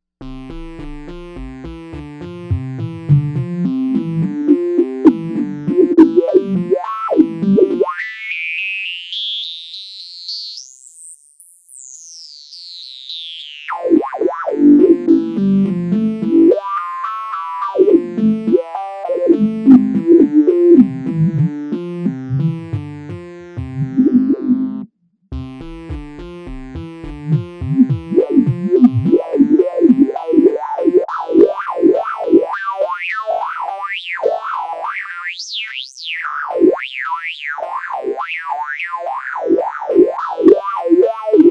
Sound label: analogize Filter Factory